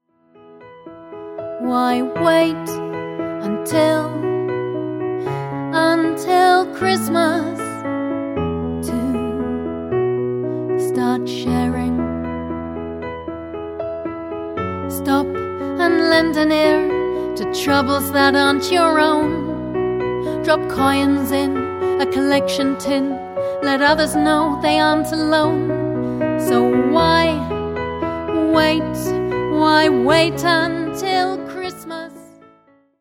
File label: Piano Excerpt